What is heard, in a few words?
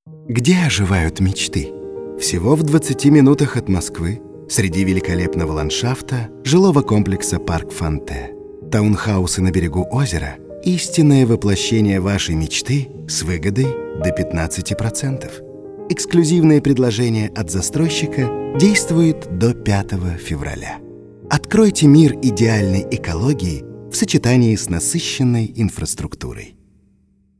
waltz